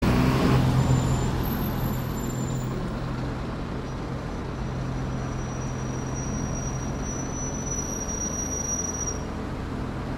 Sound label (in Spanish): RECHINIDO AGUDO, balatas